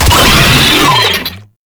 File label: Player Spotted, Vocals; Enemies; Warframe; Proto Infested; Brachiolyst